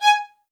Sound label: MED ATT G# 2, soft violins; Q Up Arts; Denny Jaeger Master Violin Library